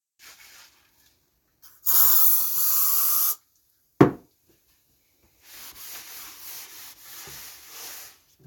Dusting

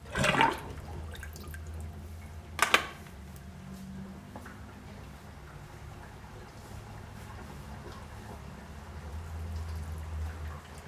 Emptying water

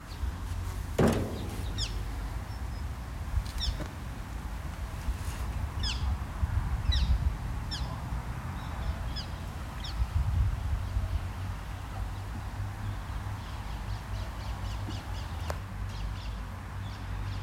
Birds

